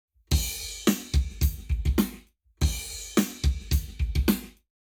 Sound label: Lab Wet, Drums 0 to 360 deg; eMagLS